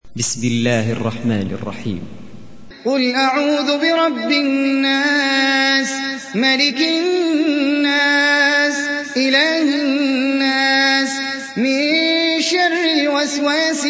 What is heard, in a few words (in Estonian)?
AnNas retsitatsioon